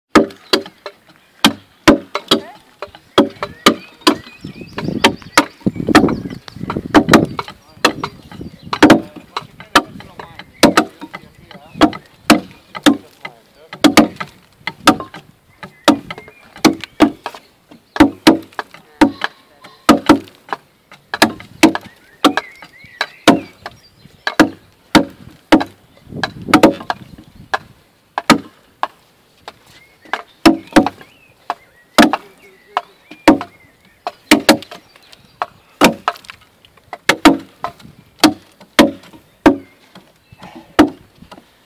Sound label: Shipyard Sounds